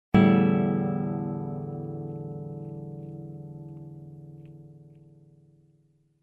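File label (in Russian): грустный звук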